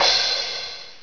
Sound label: Cymbal; Prince of Persia 2 Demo